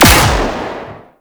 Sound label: Auto Turret Shot; Venus